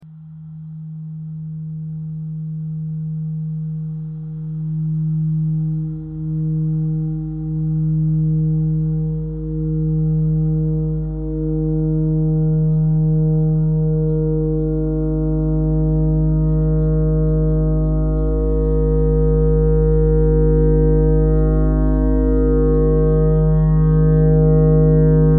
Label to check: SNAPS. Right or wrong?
Wrong